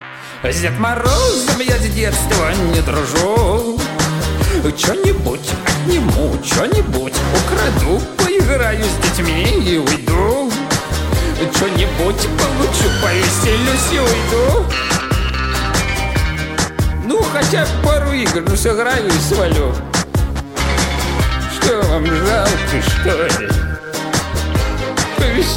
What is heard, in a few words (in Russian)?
golos pesnya